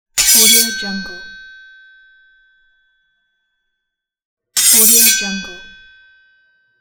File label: sword drawing; marcoserino